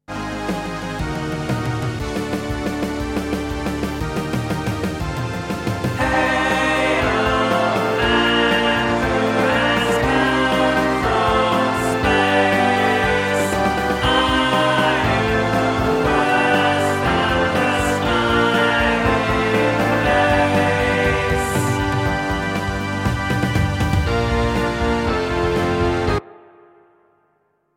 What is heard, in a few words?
bd chorus